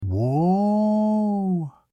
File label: Oooh; age of empires; taunts